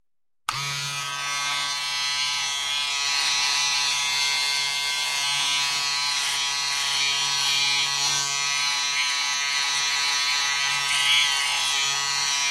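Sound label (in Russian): звуки машинки для стрижки волос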